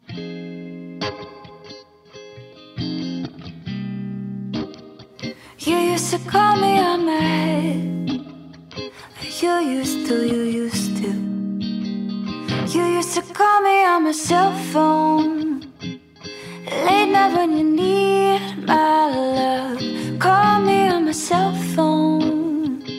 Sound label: Ringtone